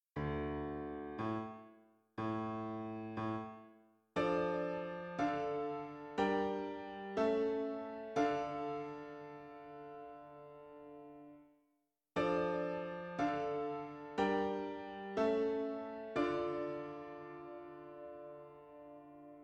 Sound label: Piano